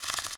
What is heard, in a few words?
flutter; npc; courier; drodo